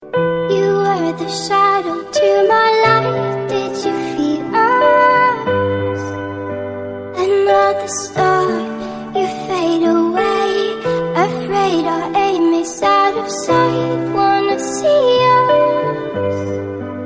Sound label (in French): sonnerie